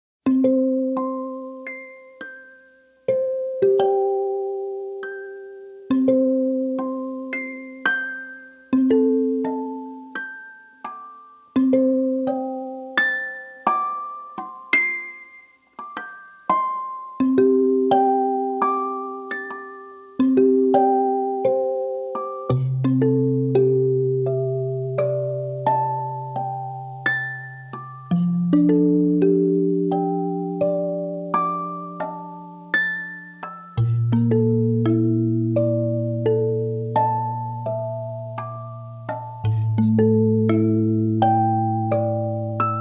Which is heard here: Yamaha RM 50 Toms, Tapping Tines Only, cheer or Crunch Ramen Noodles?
Tapping Tines Only